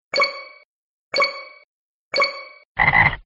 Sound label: countdown